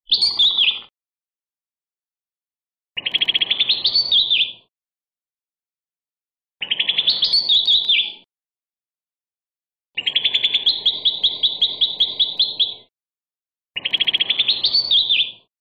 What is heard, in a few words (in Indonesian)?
suara cucak kelabu